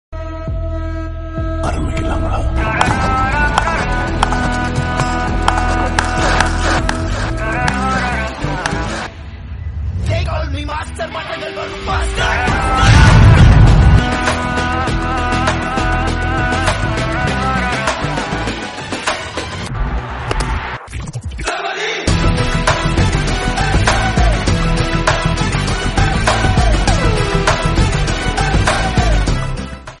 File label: bgm theme; ipl 2021; vivo ipl